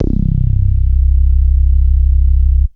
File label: Bass Synths hits and octaves